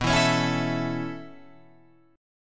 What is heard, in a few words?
B 7th Suspended 2nd Suspended 4th down Guitar Open F, chord; B7sus2sus4; strummed; JGuitar